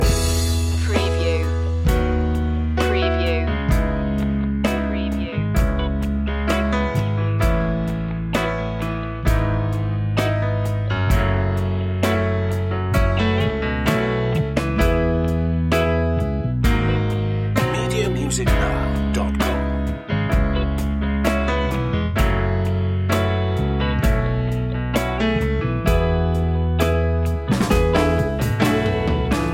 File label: southern church loop